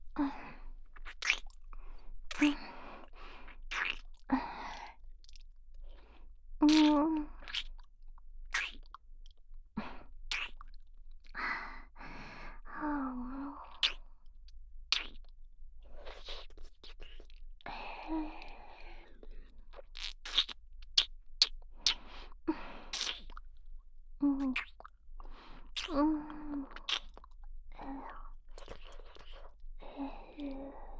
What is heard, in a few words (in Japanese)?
キスループ